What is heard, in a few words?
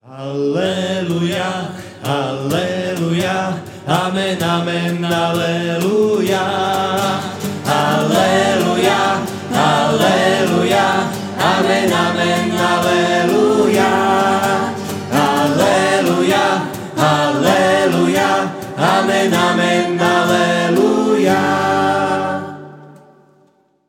Aleluja Amen; sv omsa a adorka